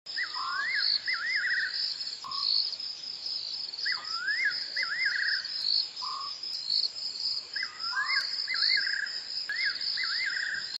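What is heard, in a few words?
Sykes's nightjar, bird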